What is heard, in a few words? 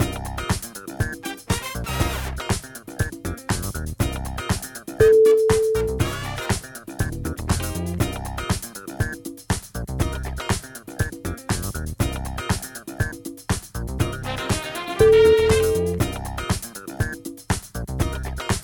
funky disco groove